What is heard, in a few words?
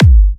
G, Kicks; markus hakala